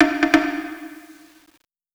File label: Wood Block Pattern; Beat Components